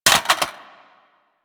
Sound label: Reload Drop Mag Default, Weapons; Warframe; Boar